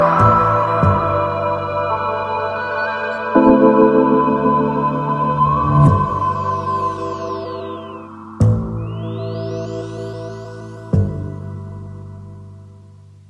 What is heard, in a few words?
Superb Ring